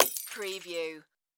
Light Bulb Smash; Sound Effects Bible

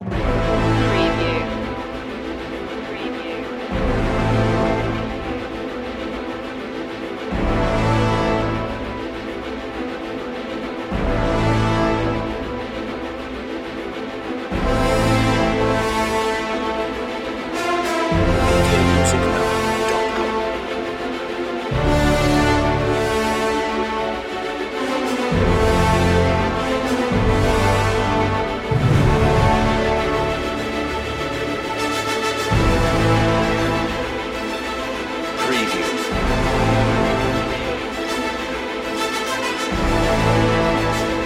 towards glory no choir

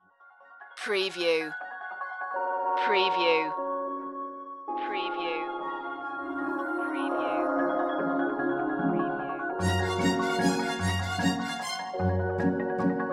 no glitches

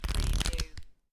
Playing Cards Fan Flip

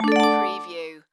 Casino Slot Machine Strum Twinkle

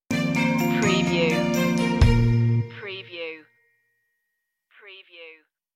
working stinger

